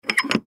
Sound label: Squeak Metal Hinge, Squeak Metal Hinge Lever; Squeaks; Foley; Objects; Blastwave